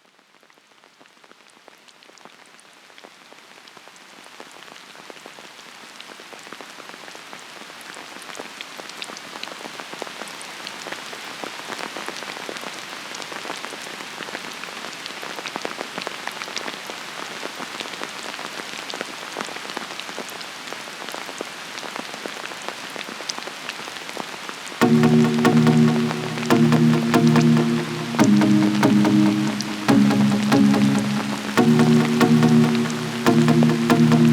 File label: Rain Trance Remix